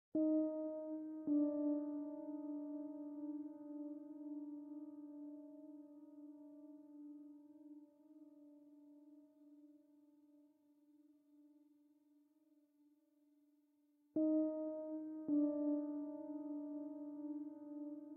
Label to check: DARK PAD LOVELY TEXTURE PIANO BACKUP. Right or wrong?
Right